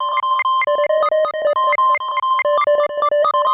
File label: Kaossilator gated (130BPM); musicradar